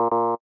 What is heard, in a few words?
Buzzer; Syrup 2 Candy Alchemy RPG